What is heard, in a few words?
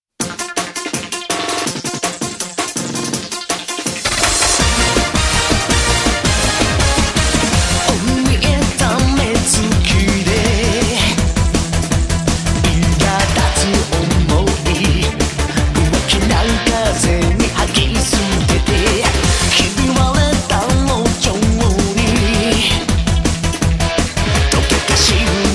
The Rage Beat